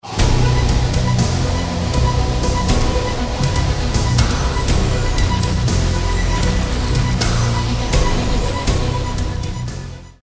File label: Techno